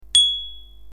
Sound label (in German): Fahrradklingel; Forest